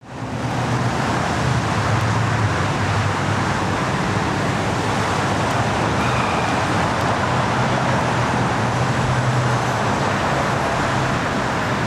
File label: Traffic, Vehicles Land